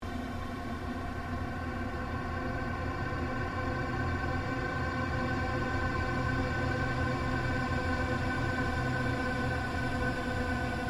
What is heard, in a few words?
Created Drone, Drone Sound Effects; sound building blocks created through manipulation of raw material